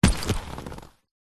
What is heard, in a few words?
luggage bag leather old metal trim buckle grab; zapsplat